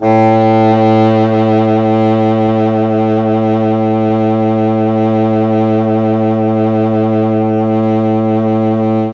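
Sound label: A1 tenor sax, mellotron; CHMB TNR SAX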